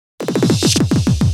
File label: Loops Fills; Vengeance Samples